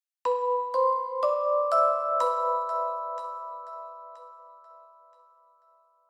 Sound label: Vibes, 123bpm; Zero-G; Nu Disco; Construction Kits